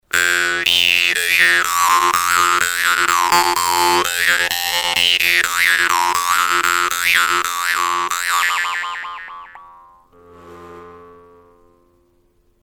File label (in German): vargan; frigate